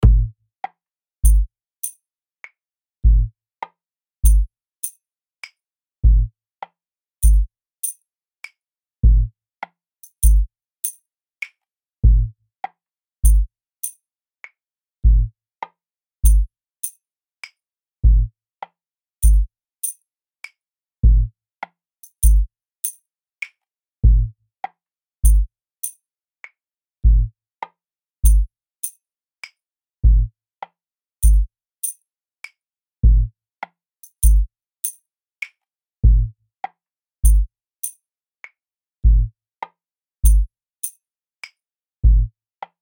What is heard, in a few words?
Five-Four Guitar Groove